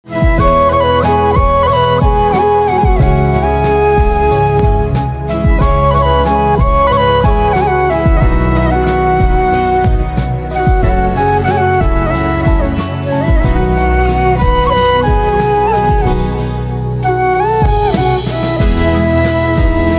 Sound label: Flute